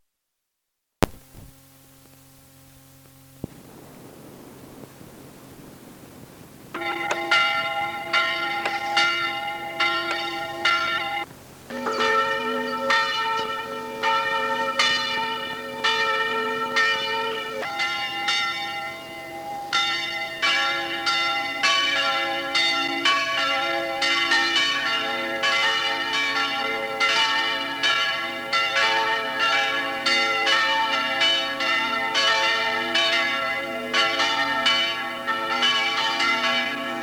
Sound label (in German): Kirchenglocken; schoenbirk